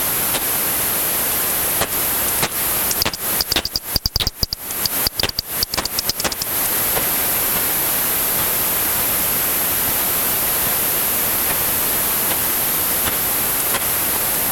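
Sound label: front window Batbox Duet 20kHz heterodyne, bat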